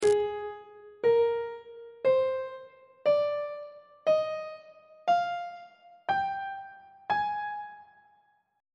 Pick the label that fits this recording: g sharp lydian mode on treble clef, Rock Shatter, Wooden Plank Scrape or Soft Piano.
g sharp lydian mode on treble clef